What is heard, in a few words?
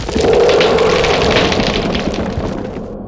tree thorn